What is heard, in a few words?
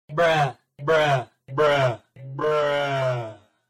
bruh sad trombone